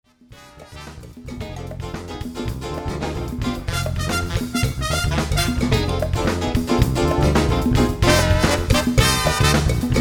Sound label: latinfusion live